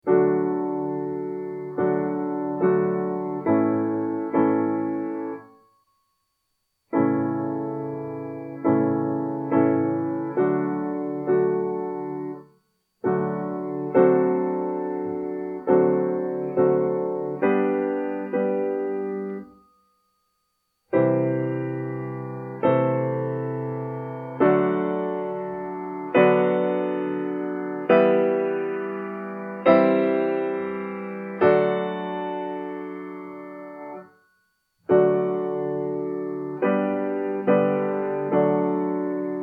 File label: Yellow Piano